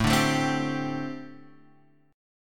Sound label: D 7th Suspended 4th, chord